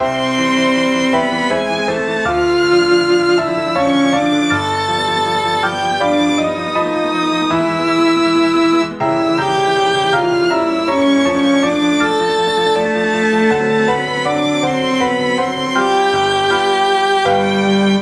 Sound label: Melodramatic Heroic; Violin, cello, piano; synth